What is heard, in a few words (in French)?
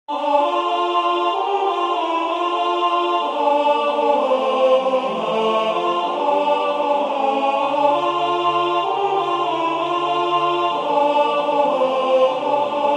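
alto, Musique sacrée; Venez Sauveur aimable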